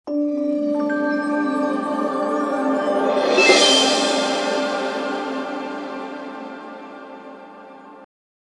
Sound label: Music Punctuation